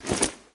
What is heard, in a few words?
clad